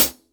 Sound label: HH CL, Drum 1 Dry; East Collexion